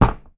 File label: footstep single metal; foley